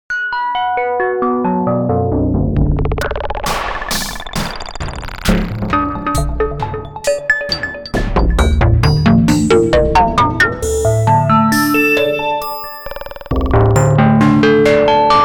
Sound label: BMF Drums; eShop